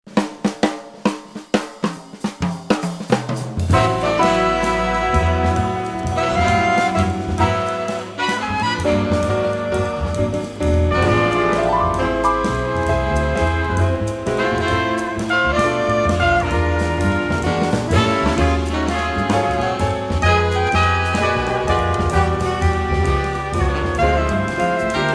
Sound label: Organ ad blues